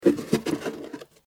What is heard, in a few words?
drawer